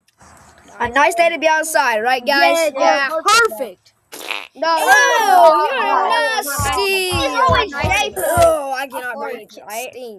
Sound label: hahaha y ha